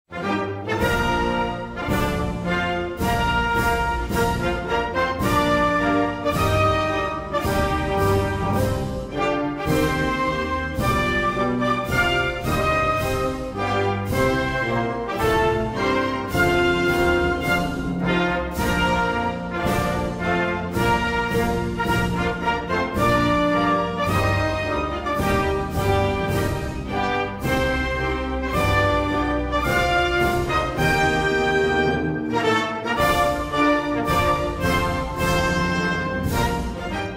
Himno Nacional de Haití

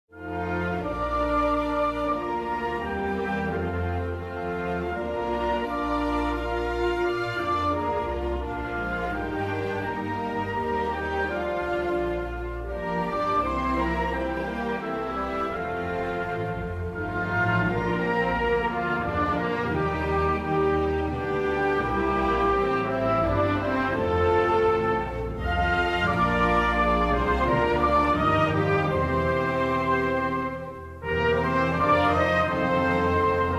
Himno Nacional de San Cristobal y Nieves

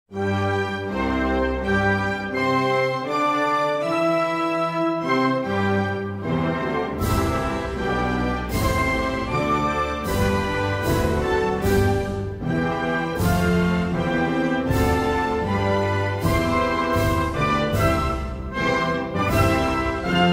Himno de San Vicente y las Granadinas

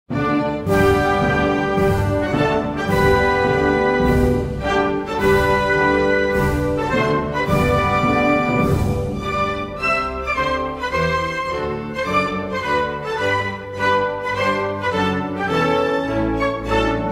Himno Nacional de Gabón

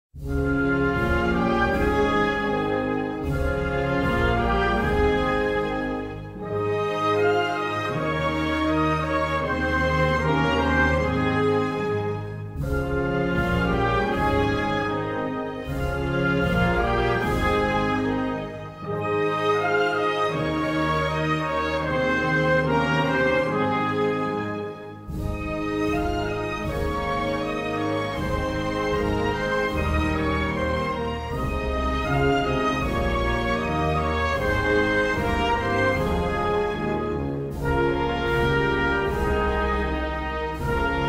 Himno Nacional de Birmania

